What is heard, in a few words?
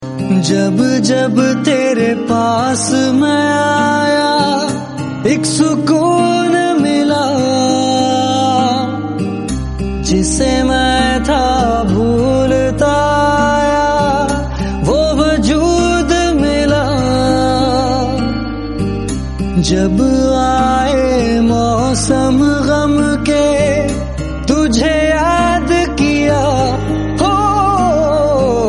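heart touching ringtones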